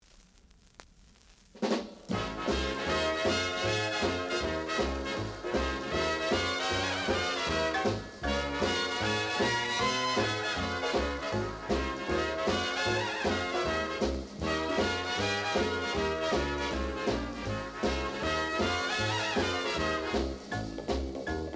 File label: trumpet